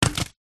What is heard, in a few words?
impacts stick branch large drop ground; zapsplat